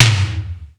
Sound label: ELEC.DRUMS; Crypton - DrumBase5000